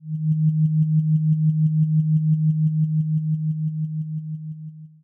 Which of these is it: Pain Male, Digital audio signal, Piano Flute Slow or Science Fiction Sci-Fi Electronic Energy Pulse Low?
Science Fiction Sci-Fi Electronic Energy Pulse Low